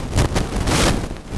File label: Turntable Loop; Sound FX